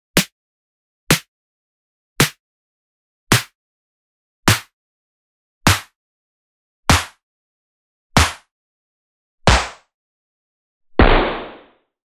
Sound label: Dry Glide Drum; Gunnbladez